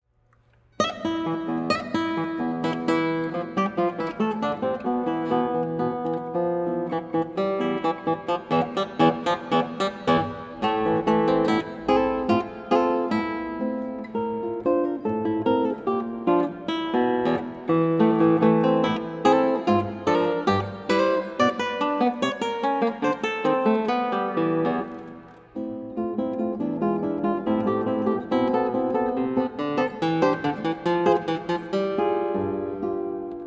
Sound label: Polka